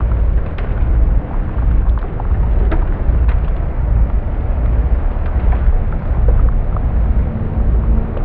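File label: lithoid ambient destroyer hum; Stellaris; lithoids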